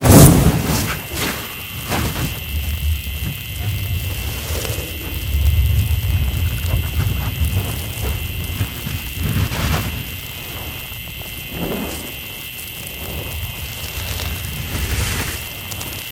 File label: Focus Fiery